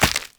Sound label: Agaric Mushroom Grow; Objects; Pickups; Resource Materials